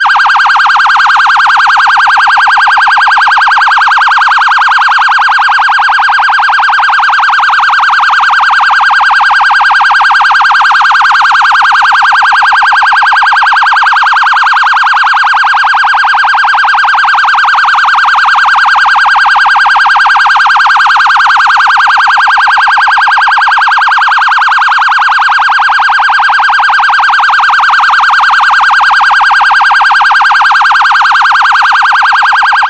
police phaser siren

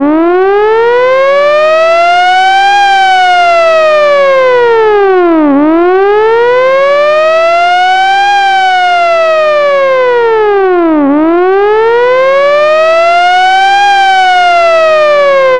vintage hi lo siren

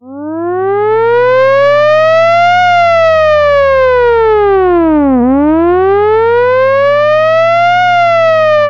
air raid mechanical siren

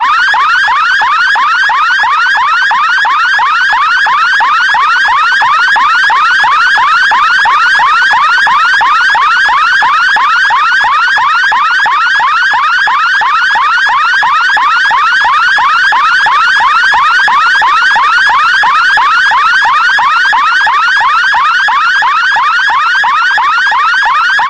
police piercer siren

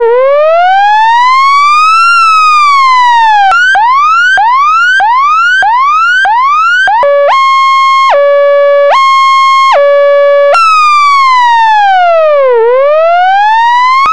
emergency electronic siren

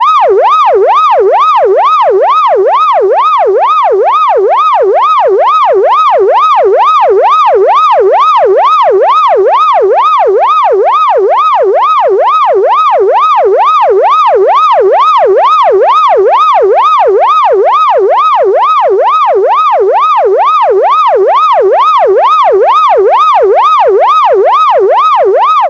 electronic siren

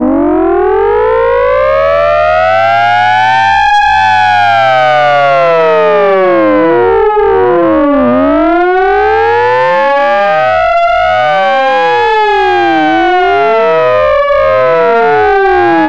civil defense alert siren

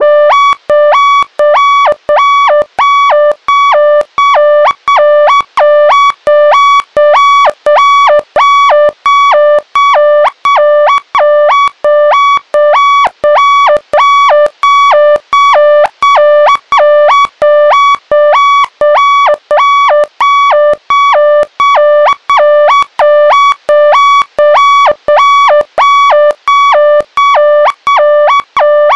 warning electronic siren

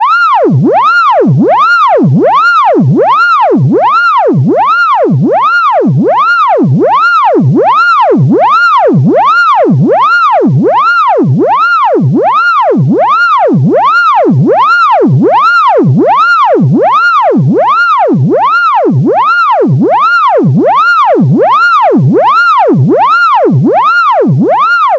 electronic siren

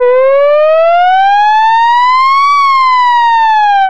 short alert siren

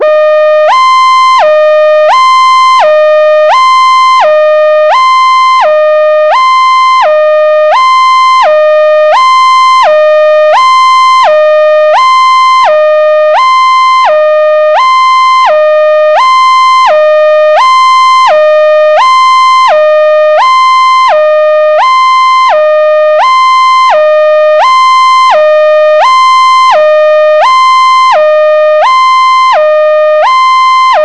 police hi lo siren

